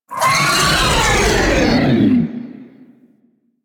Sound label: Vocal Stunned, Enemies; Warframe; Teralyst; Ropalolyst